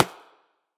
clap; smite; god sobek

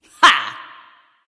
yay; freya

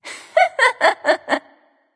hehheh; smite; voicepack; isis

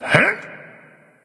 grunt lift; Smite; bacchus

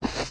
footstep soft; guanyu; smite; hades

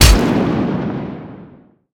land; smite; chaac

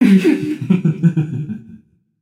laugh, voicepack; smite; jorm

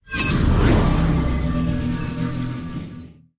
charging; smite; god atlas

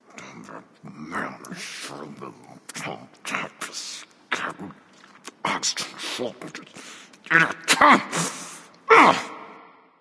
grumble; smite; ares

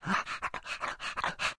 boar lobby victory pet; smite; god artemis

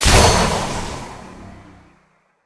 explode; smite; chiron

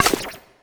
staff stab; smite; ahpuch skin10

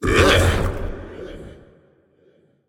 "ugh"; smite; sylvanus

